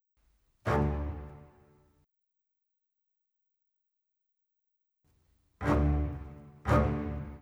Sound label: Bass, Orchestral; Sonic Foundry (Sony Creative Software); Orchestral VOL-2; Modern 24